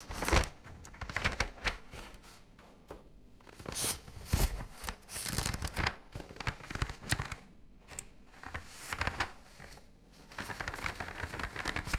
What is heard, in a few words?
handling an old leatherbound book; foley